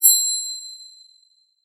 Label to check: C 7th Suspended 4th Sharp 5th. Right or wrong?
Wrong